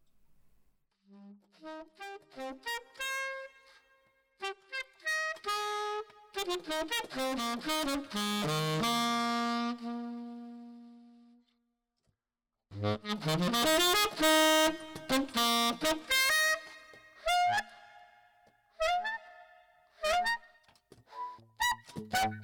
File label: adaptive saxophone